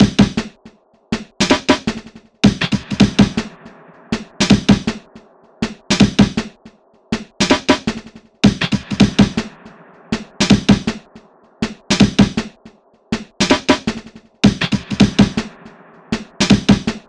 Amen mal anders; XLN Audio Life

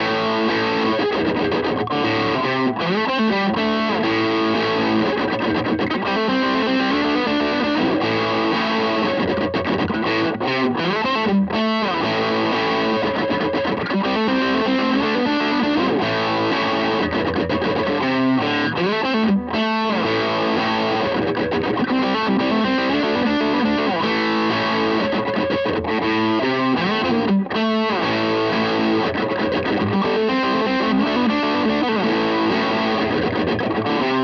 Maybach Harley Benton Vergleich Maybach Zerre

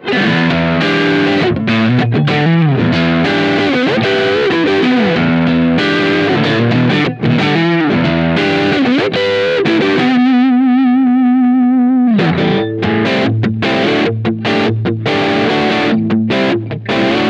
Schecter C 1 Exotic 3 Pickup Positionen Split Drive

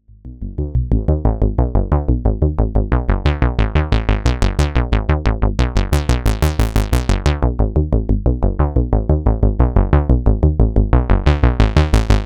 MOOG Mavis Filterspielereien ungepatcht

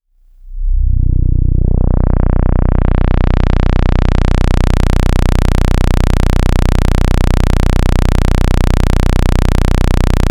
Saegezahn durch Filter

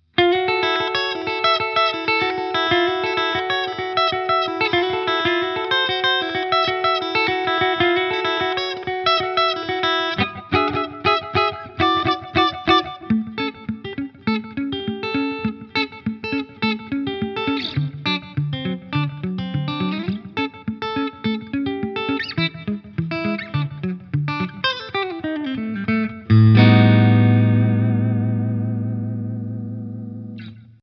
Gretsch G5422TG Electromatic Mitte clean

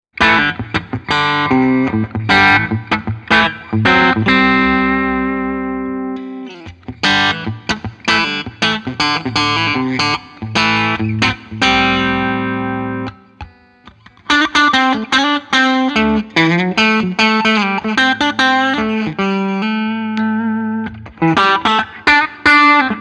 Yamaha Pacifica Standard Plus clean Steg Colisplit

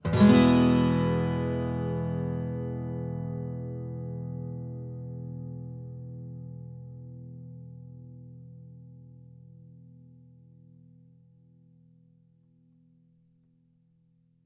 Gitarre RODE NT1-A

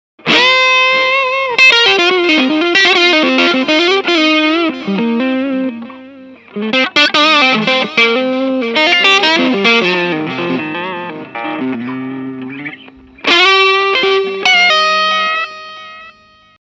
Hals HB Bluesy crunch

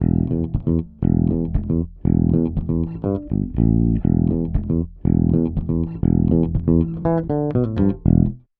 Summit Audio EQP 200B E Bass mit EQP 200B

